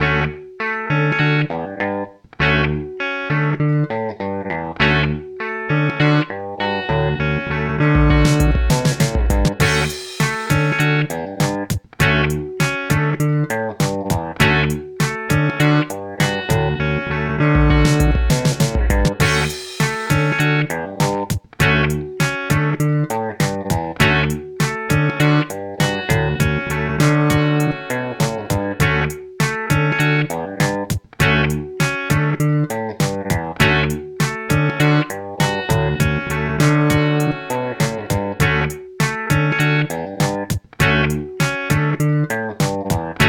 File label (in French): Cordes Rocket Beat Influence